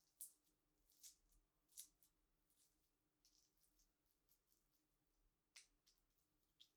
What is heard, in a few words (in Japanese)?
コンドームをかぶせる; アダルト系